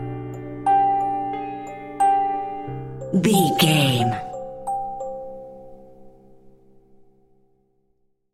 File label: modern horror stinger